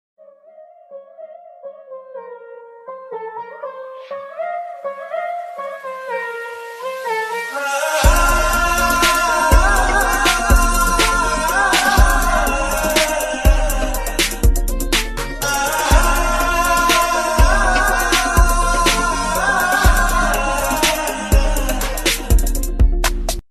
love bgm ringtone, south bgm ringtone, tamil ringtone, telugu ringtone